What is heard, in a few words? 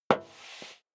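step leather shoes metal dry scuff, footsteps; schritte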